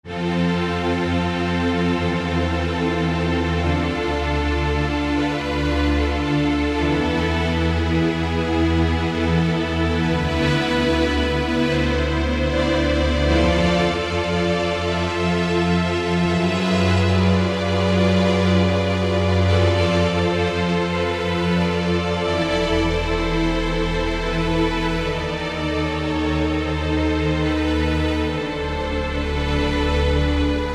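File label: Film Strings, Generalmusic Equinox; FilmStrngs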